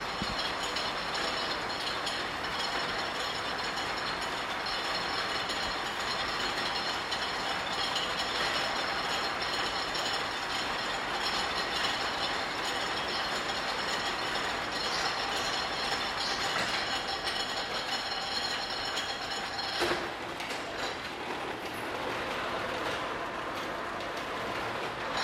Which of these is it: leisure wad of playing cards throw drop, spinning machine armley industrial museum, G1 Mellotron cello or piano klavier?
spinning machine armley industrial museum